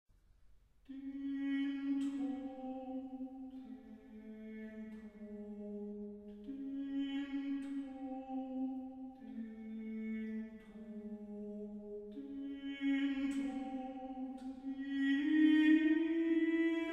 Chorale for 4 voices, single voice; 'Christ lag in Todesbanden'; BWV 278

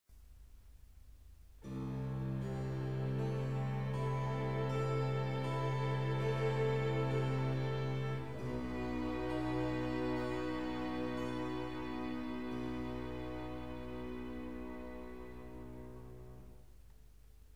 Brandenburg Concerto No. 3 in G major, Andante; Bach; Brandenburg Concertos; BWV 1048

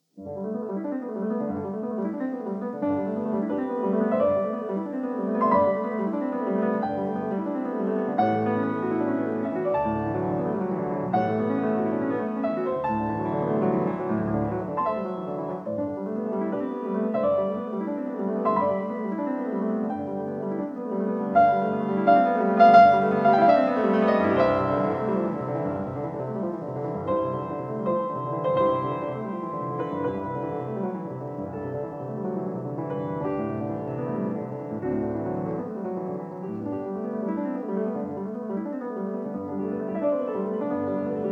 Prelude No. 3 in G major; F. Chopin; 24 Preludes, Op 28

